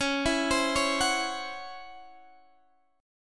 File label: Db Minor Major 7th Double Flat 5th, Guitar, Drop D; x,16,14,17,14,14; arpeggiated